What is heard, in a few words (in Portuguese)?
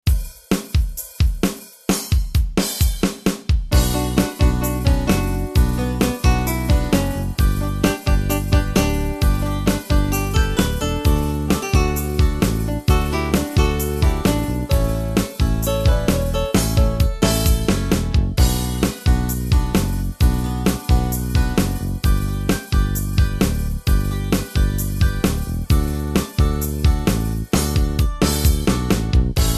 ritmos yamaha balada sertanejo universitario